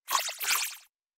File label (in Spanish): Grito de Skwovet